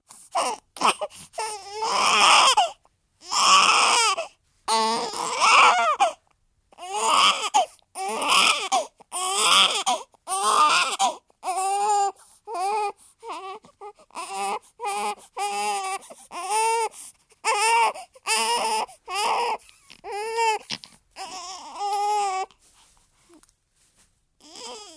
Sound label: 17 day old baby boy, crying; Babies; Voice; BBC